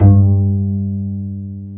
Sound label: Bass, Roland